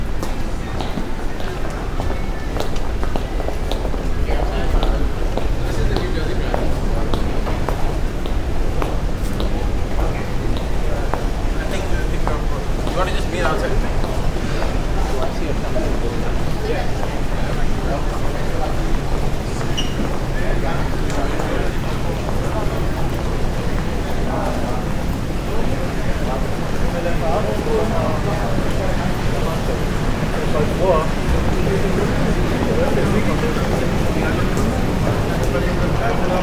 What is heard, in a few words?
Penn St